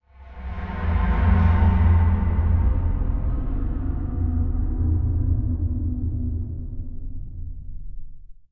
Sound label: Scary; Dark Dimensions: City of Fog